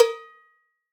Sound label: Cowbell L; Roland SPD-S